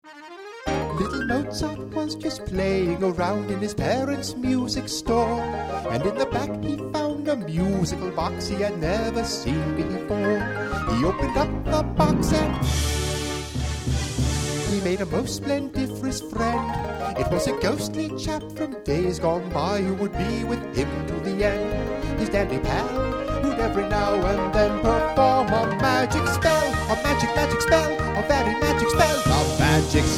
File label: Song Vox; children's educational series; Little Mozart; OhLook Productions